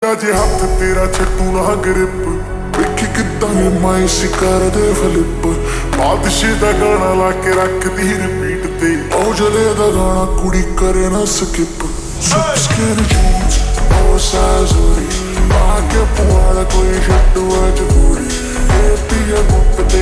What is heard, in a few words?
Ringtone slowed reverb